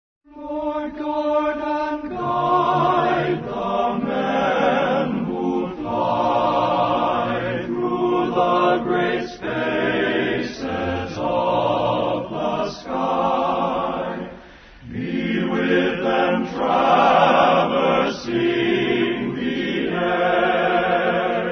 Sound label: air force hymn